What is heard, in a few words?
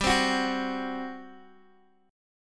G Major 7th Suspended 4th, G, down, Guitar, DADGAD